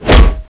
razor knife hit wall

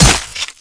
sword hit

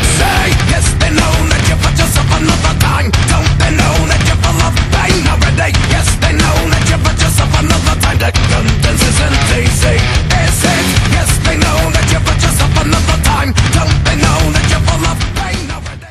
metal; roundsound

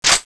clip in

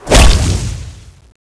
razor knife hit

